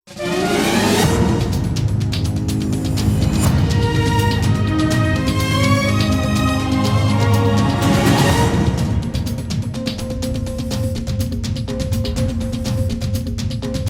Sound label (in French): generique jt; tf1; le20h; fictif